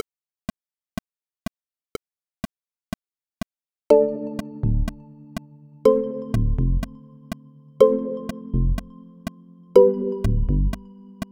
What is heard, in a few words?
Moving Through Time with click, dumbek track